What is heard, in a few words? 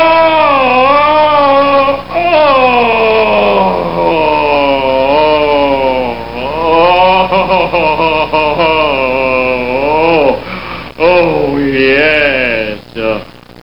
scream; homer